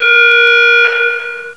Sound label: escape alarm; svencoop